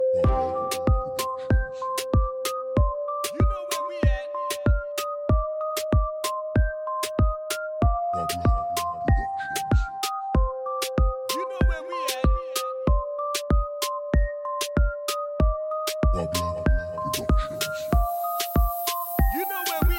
Rumba Robot

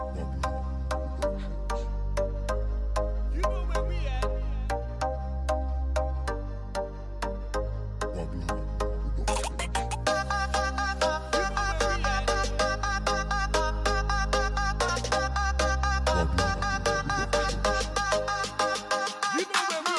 Reggae Dancehall

